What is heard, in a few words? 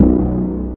Menu Synth Tone